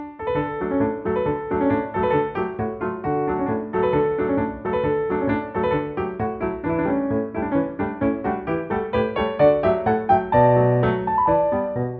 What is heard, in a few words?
Monkeys, ballet music for little kids